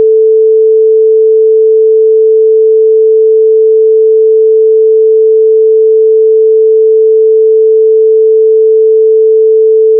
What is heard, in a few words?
tone 440 mono